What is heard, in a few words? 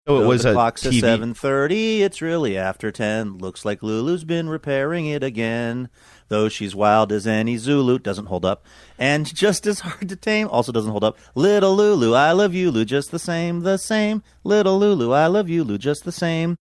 singing lyrics